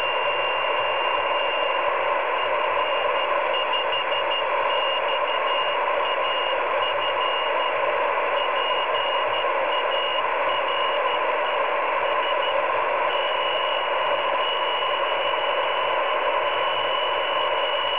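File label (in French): balise CW, Balise 24 GHz; l'antenne utilisée est une parabole prime focus de 20cm de diamètre provenant d'un radar routier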